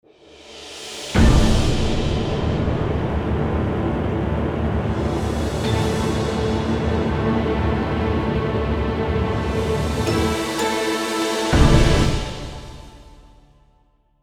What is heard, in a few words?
Orchestral Phrase; FXHome